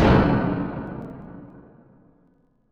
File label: big clank